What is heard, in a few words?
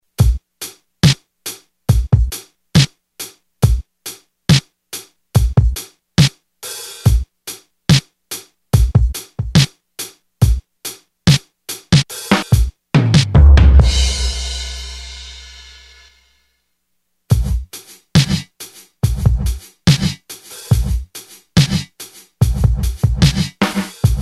reverse gate, Yamaha REX50